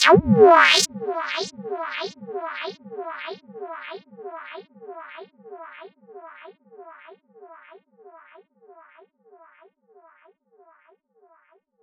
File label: Squelch E 142 BPM, Psytrance; Ghosthack; Psytrance Visions Volume 2